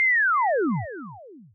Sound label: Falling Sound